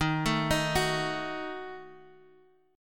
Eb Diminished, guitar

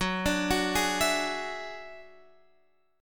F sharp 7th Suspended 2nd Sharp 5th F sharp

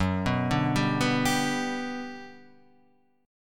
G Major F#, chord

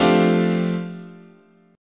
F 6th Add 9th, chord, guitar

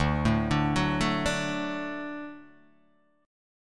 D Major D down Guitar Open D

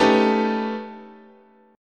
A 9th Flat 5th, chord, guitar

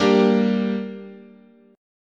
G Minor, guitar chord

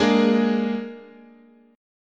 Ab Suspended 2nd Flat 5th, guitar chord

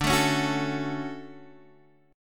D-Major 7th, D

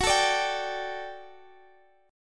D Suspended 4th F sharp, guitar

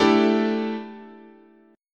A 7th Suspended 4th, chord, guitar

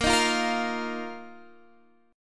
A sharp Suspended 4th A sharp

